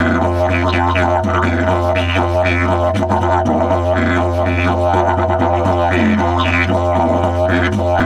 120, Didgeridoo

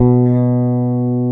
BASS B2, Electric Basses; PlatinumPhattCD2; E-MU Producer Series Vol. 8 – Platinum Phatt

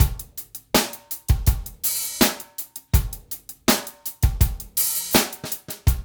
DRUMS; East West; Funky Ass Loops

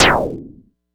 Cwejman S1; Jokller; 300 Drum Machines; DevSnd

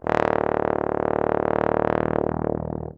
BARITON; Best Service; Alpine Volksmusik

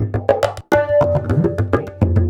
TABLAS; Zero-G; Chemical Beats

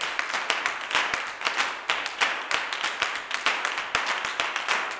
CLAPS 96; Spectrasonics; Vocal Planet; Blues Soul; G. CLAPS

